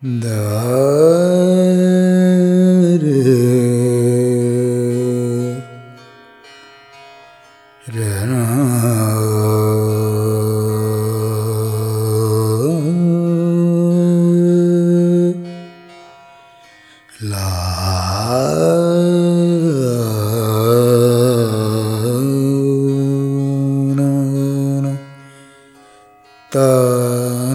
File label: Alaap, Vocals Male, World Pop; Sonic Foundry (Sony Creative Software)